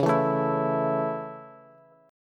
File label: Db Minor Sharp 5th, Bass; strummed; 21,19,19,21